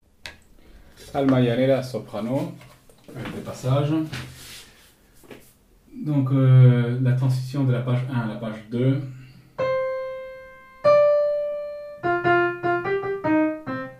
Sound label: soprano passages